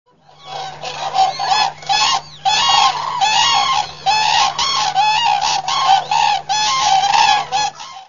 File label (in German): kranichruf